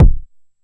m base, Jomox Mbase01

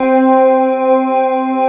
METAL TINE C4, SD1 KEYS; East West - Global Sounds

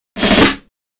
Snare Fill

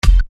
Bass Dr, EuroDance Bdrums

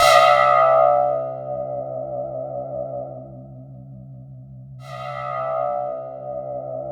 C2, SynthLine; 90 s Sample CDs; NiceBeats; SynthLine VOL-16

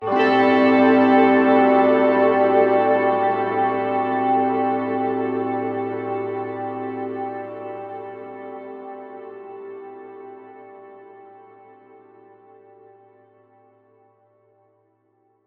Guitar Chords A; Zero-G - Future Wave

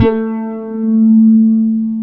WOW GT1-A2, WOW GUITAR; AKAI S-Series CD-ROM Sound Library VOL-7

